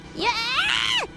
Scream; she just woke up; IRyScream

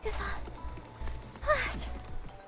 noises

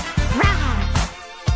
RAWR

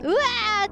uhaaa; IRyScream

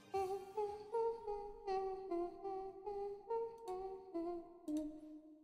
Singing humming

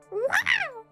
noise; Toad

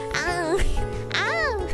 moans

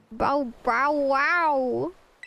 bao baoaow

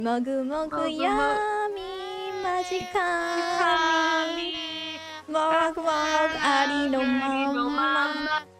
singing Mogu Mogu Yummy

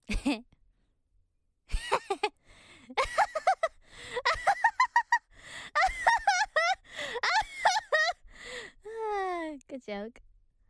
laugh

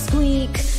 squeek

